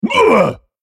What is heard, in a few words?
spellcast effort